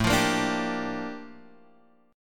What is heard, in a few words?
Bb Major 13th A